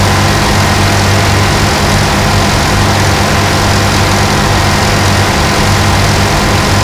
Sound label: Bradley Engine Max; DCS